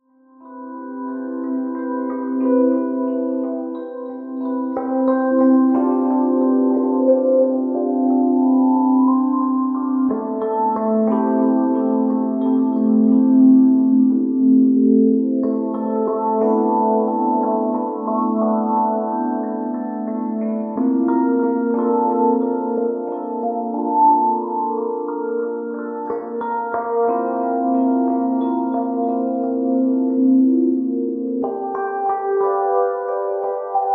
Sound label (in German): Entspannungsmusik für Babys